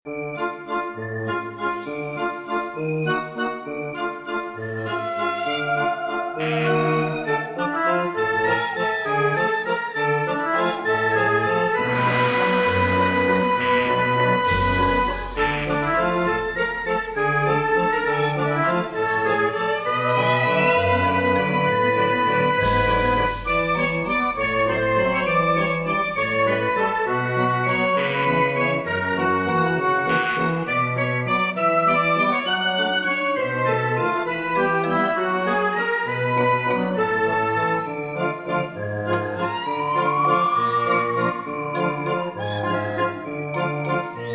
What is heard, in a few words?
Loading Loop; the sims makin magic